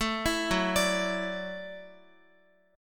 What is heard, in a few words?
Gb Minor 7th Sharp 5th, Guitar; Gbm7#5; arpeggiated; x,x,7,9,6,9